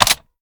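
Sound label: sniper reload extended mag mag in; gmod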